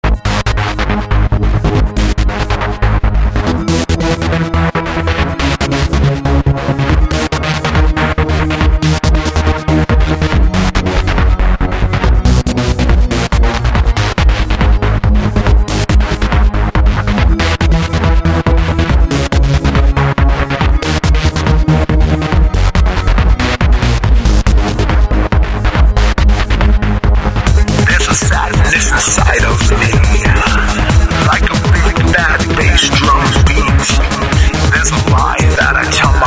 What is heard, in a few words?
Big Bad Bass Drum Beat